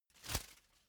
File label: whoosh paper scrap flutter